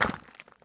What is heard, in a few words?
sprint glass; Escape from Tarkov